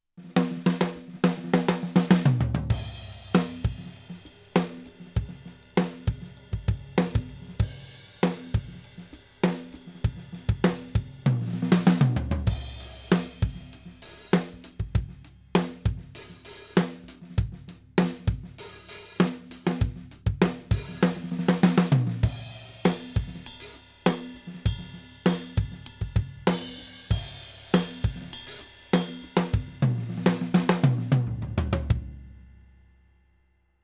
Flat Monitor Pass

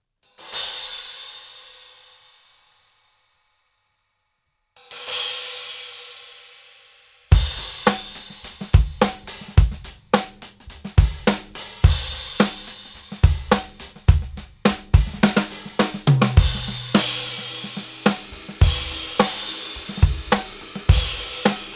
Trash Crashes; Meinl

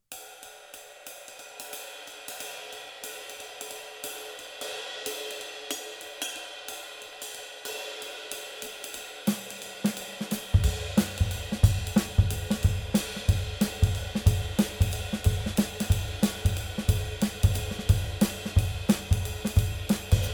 20 in Bounce ride

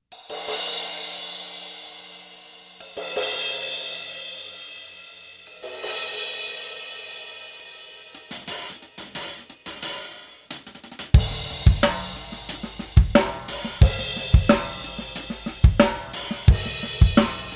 Signature Precision crashes and hihats; Paiste

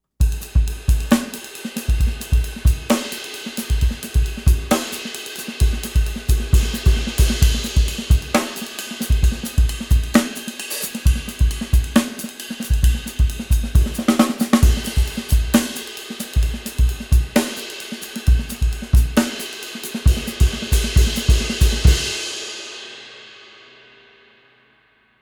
Meinl Byz Extra Dry Thin ride

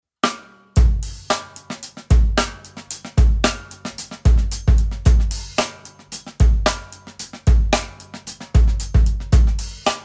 Custom Solid Cocobolo Snare; Grover

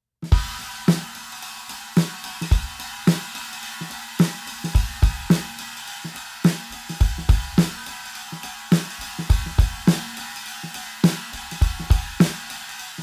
Zildjian Swish Knocker

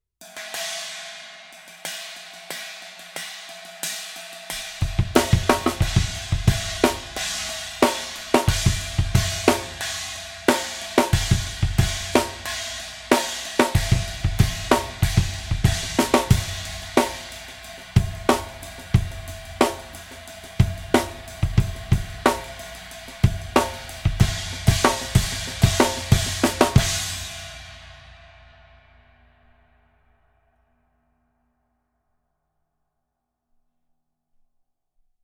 Zen China

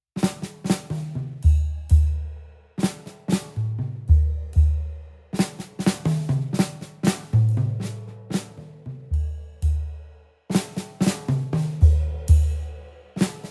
kit; Creation Altschul

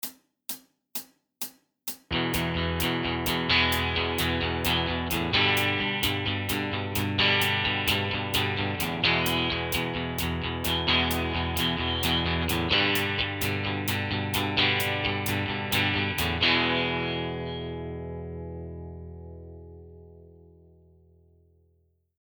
eighth guitar strumming

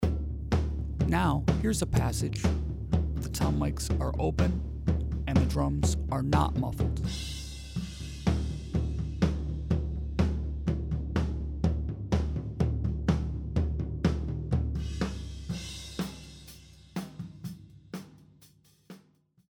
Toms open

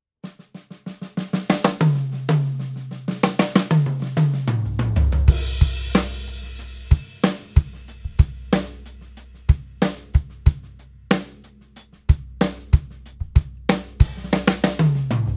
Gretsch Renown Oak

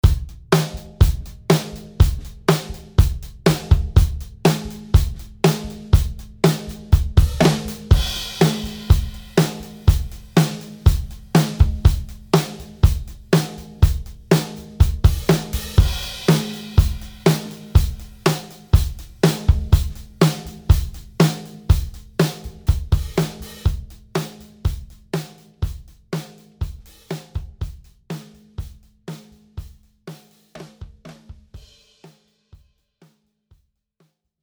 Super Vintage snare; GMS

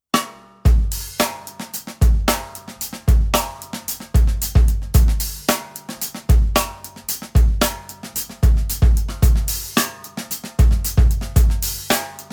Custom G3 Solid Rosewood Snare; Grover

